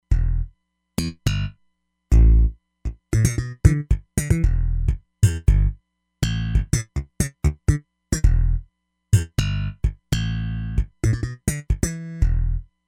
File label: Got Pop, Roland Fantom XR